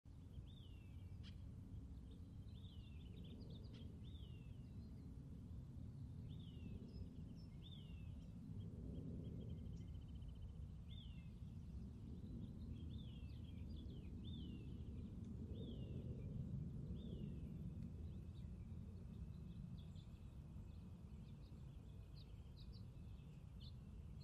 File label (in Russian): slyshno gul blizkogo goroda